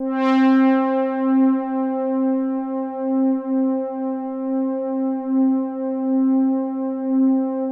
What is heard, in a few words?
OBERHEIMS